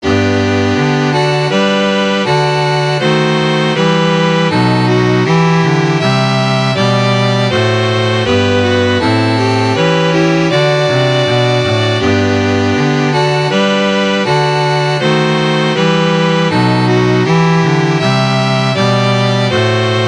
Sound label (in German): deutsche nationalhymne streichquartett